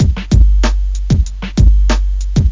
breakbeat; World of Sound; instruments; beats

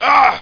oooff; quake

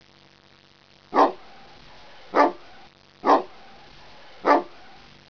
bark

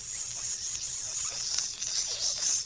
fizzle; The Interactive Alphabet

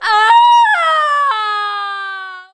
falling; Messiah; domina

